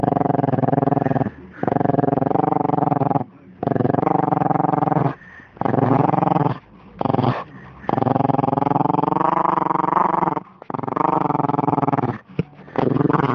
wrrr

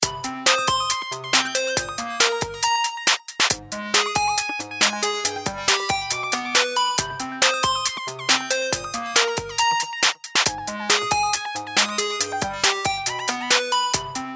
htc synth

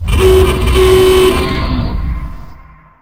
old horn

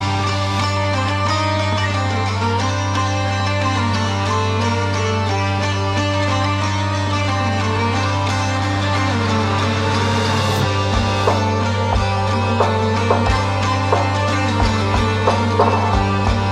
cool tone

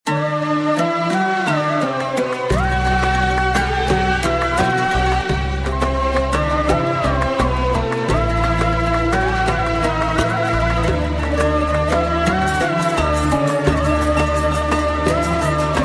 sad soul

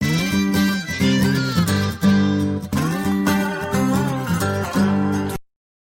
country style sms

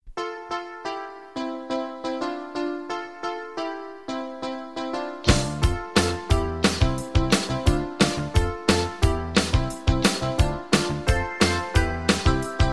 pop

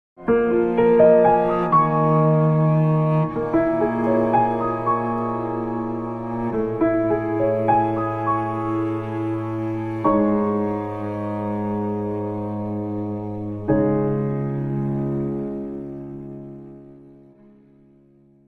classic ringtone; apple

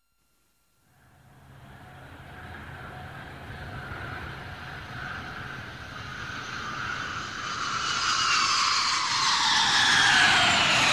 aeroplane sound

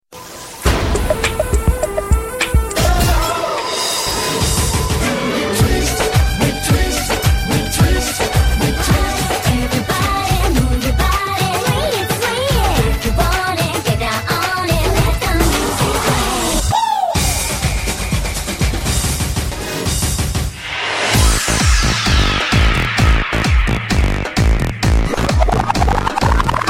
bollywood remix

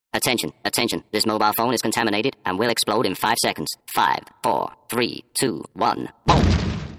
mobile bomb

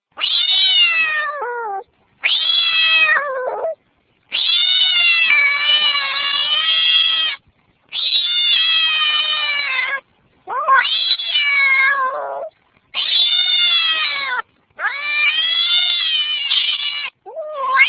angry cat

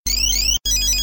ring ring

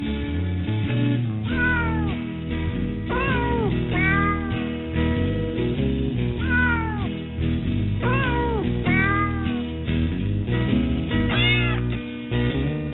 cats singing xmas

